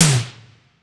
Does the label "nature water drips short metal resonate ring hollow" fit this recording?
No